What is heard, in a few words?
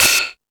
High Hat, Drum Machines E-MU Pro-Cussion